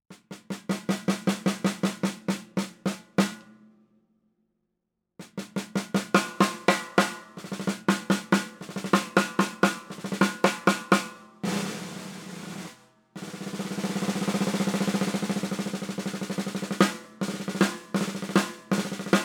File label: Damico